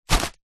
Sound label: foley balloon foil helium grab hand pick up